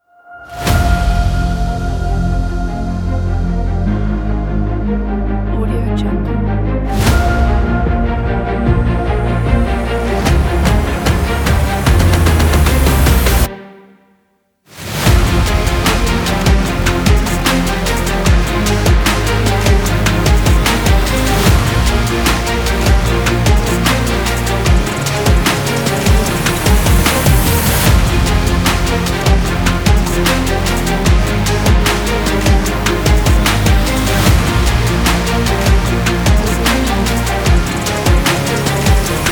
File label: epic sport music